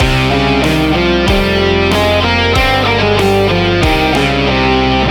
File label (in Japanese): フリジアン